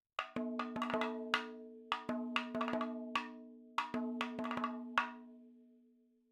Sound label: 100 4 4 Mad Fills